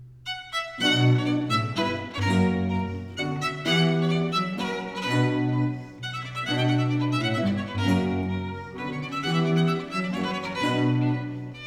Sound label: Ayres for the Violin, Sonata in C Minor, Aria - Allegro; Book 1; Italian Baroque Violin Sonatas; Nicola Matteis; Ayres for the Violin, Vol.1; 尼古拉斯 · 麦克吉根